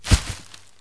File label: Med step, Dino